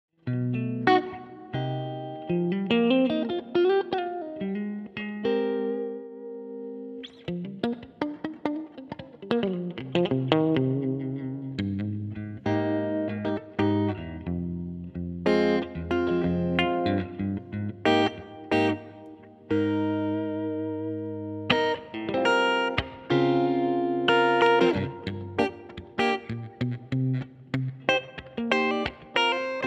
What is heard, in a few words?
slick jazz